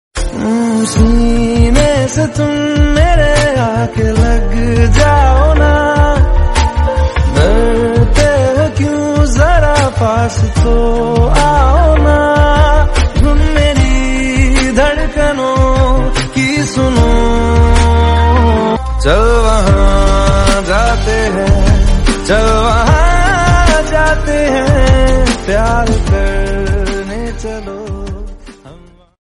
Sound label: slowed